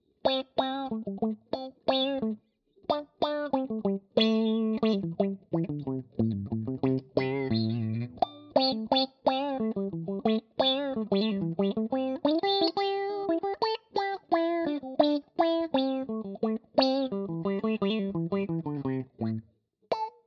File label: filter